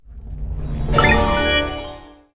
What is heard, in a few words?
candy Win Reveal Medium; fairyhansel